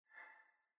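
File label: button hover; casino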